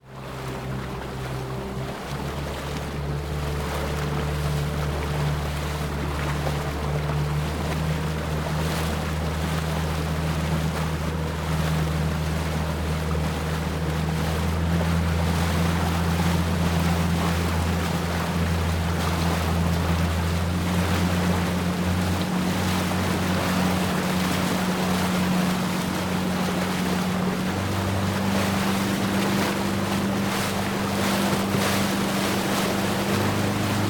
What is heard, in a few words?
Boat Mercury ONBOARD Riding Sequence Various Speeds FRONT, Lake Boat, Mercury 4-stroke 60HP; MKH8040; Dramatic Cat